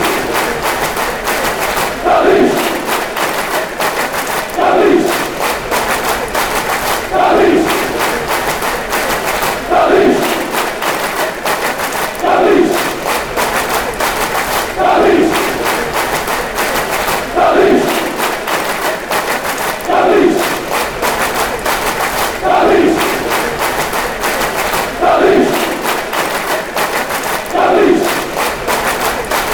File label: chant; dalglish